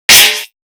Snares, Alesis Performance Pad

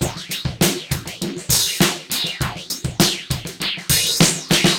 Beat Loops; Zero-G; Monster Beats

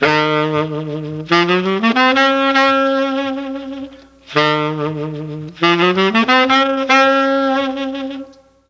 G3 SAX, Mellotron; Mike Pinder - Mellotron Archive CD; FOXTROT+SAX

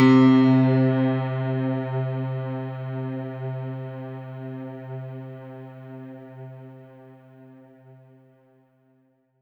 C3, synth; 90 s Sample CDs; NiceBeats; SynthLine VOL-23

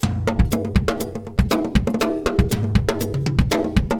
Drama Drums (120BPM); musicradar

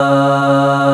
CHORUS C#3; CMP - Analogon; TREMOLSKI

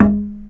GAMBANG G#3; Spectrasonics; Heart of Asia

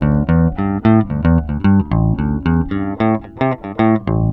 FREE L 111 A, Bass Grooves; USB Soundscan vol.30; AKAI

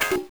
Guitar Hit; NBA Live 95; M8; Genesis

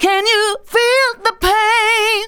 vox, Techno Trance; Ueberschall

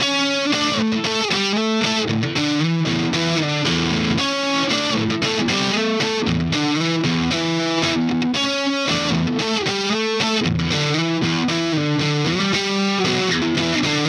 Melody Riff Guitar, Indie Rock; Sonic Foundry (Sony Creative Software); Indie Rock Anthems; Session 06 - Haze

